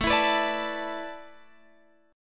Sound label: A Suspended 2nd Suspended 4th, Guitar, Open F; Asus2sus4; strummed; x,16,16,18,17,16